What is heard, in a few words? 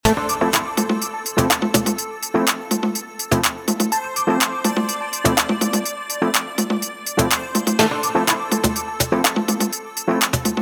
Bass Groove